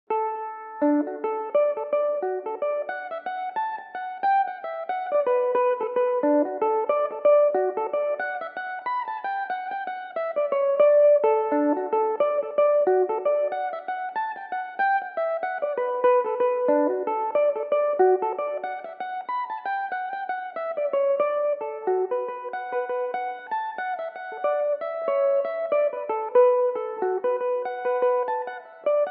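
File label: D major belmuse, irish mandolin; The Girl From Old Bride Street; Belmuse EM-190 electric mandolin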